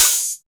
Open HH, Cymbals

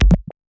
Lat, Kik, One Shots; Sonic Foundry (Sony Creative Software) - Future Retro Dance Excursions

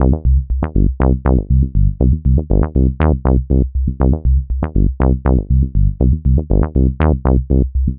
Bassline, Prototechno

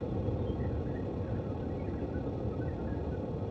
Ambience Loop, outdoor

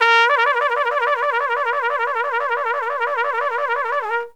TRUMPET FX; Zero-G; Phantom Horns

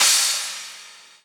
Crash, Cymbals

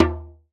Tera, Drum Machines

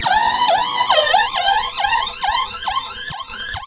138 FRESH; USB Soundscan vol.20; Fresh Disco House I; AKAI; DISCO ADD

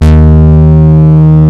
D#2 SHRT, Moog Synths; E-MU Formula 4000 Series Vol. 3; Analog Odyssey

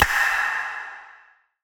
Finger Clicks; Antidote Advent

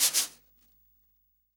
SWING SNARE CHROMATIC, Real Drum Kit; REAL DRUMS

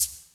SHAKER, REGGAE ETHNC; Pro-Rec - Synthsonic

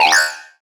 Sci-Fi Lasers - Droid Talk; Antidote Advent

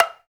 African Perc; Spectrasonics; Supreme Beats; African Contemporary; Afro DRMs

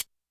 Guiro Short; YAMAHA RM1X

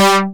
SEQUENCER, synths & keys; Techno Trance

